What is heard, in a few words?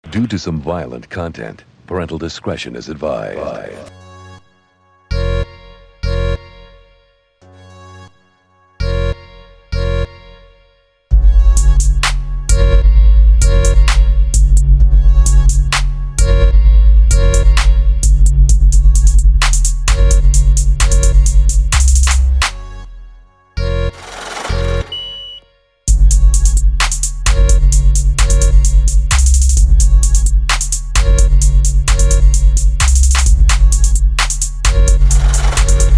beats